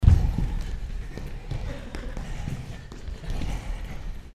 dance group

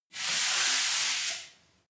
broom sweep slow single

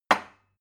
glass put down cupboard hard

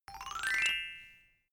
notification glockenspiel slide up soft

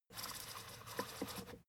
fader plastic stutter soft

